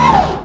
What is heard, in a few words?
turret end